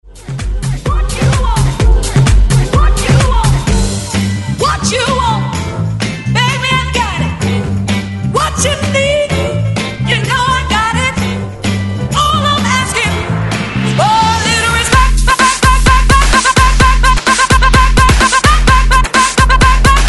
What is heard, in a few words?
128 BREAK MIX; DJDOLLS